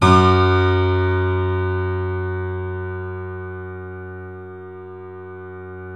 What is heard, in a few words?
F#, The Piano; InVision Interactive - The Piano; YAMAPLAYHARD